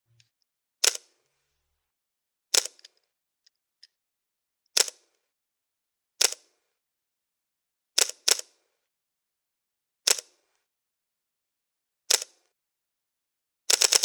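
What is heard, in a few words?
Panasonic lumix DC S1R, camera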